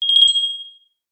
multimedia alert notification basic bell synth positive chime ring